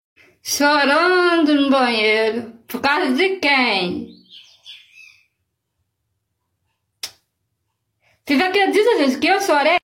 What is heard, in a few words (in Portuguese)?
chorando no banheiro